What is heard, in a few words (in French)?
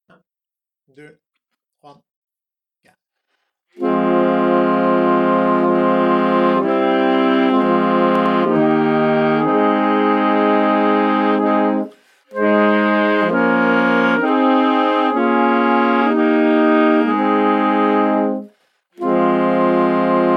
Pavane tutti; orchestre a la maison